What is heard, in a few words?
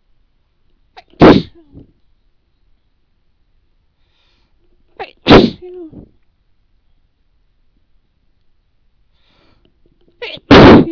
lrg snz, female